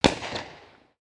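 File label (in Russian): gorit feierverk multiplikacionnyi zvuk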